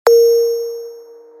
ping

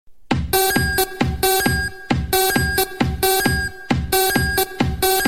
Sms Techno

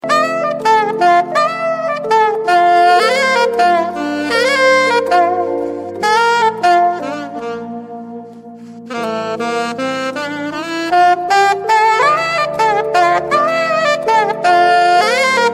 sax

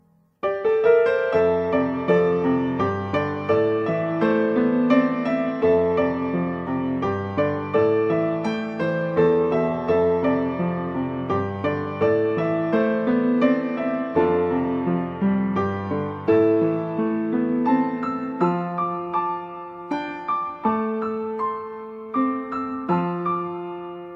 Thank You Piano

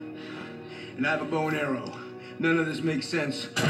Bow And Arrow; Bow and Arrow 2

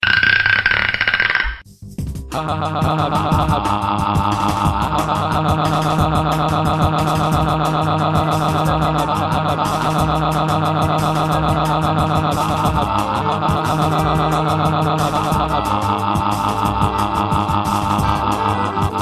Hahaha

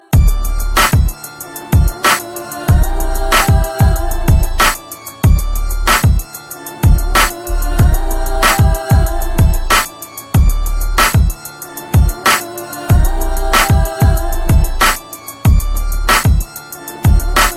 Gangsta Beat

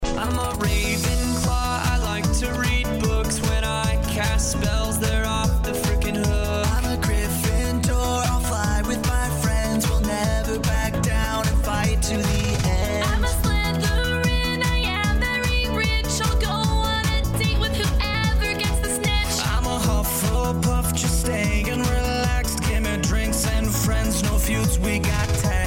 House

